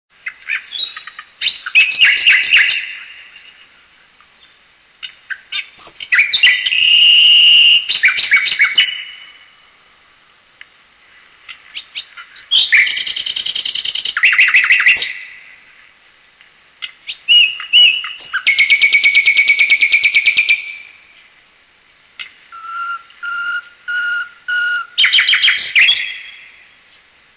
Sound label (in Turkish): Bulbul Kanarya Sesleri, Telefon Zil Sesi